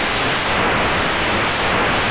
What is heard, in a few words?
Static